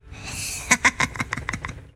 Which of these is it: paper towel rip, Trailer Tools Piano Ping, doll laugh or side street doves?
doll laugh